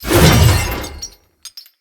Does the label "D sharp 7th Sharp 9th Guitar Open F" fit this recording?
No